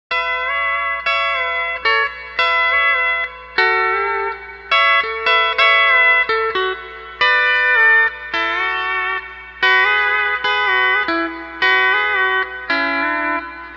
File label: Chimes Improv; Rose City